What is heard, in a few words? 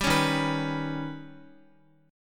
Eb Augmented 7th Eb down Guitar; Eb+7 strummed; JGuitar